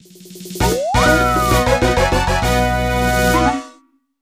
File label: Casino Delfino Fanfare, Chiptune; Gamecube; Super Mario Sunshine